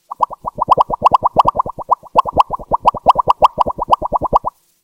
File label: water bubble